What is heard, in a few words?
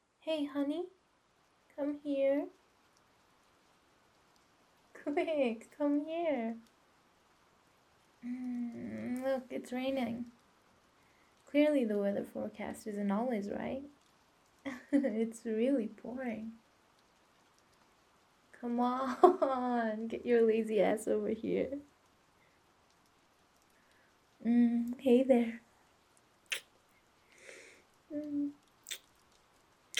rainy night kisses